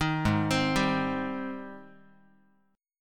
Gsharp Major 7th, Guitar; arpeggiated; 6,4,3,5,x,x; 4A,4E,5A,5D,5G#,6C#; G#M7

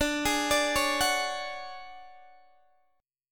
D Major 7th Flat 5th Guitar DADGAD, chord

